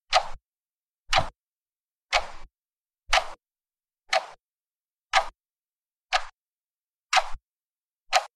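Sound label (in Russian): тиканье часов без реверберации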